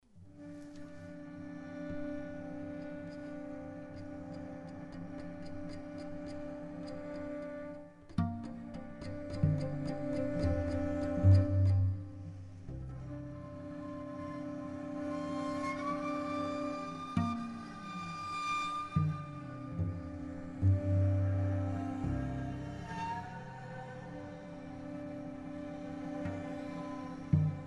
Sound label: referee start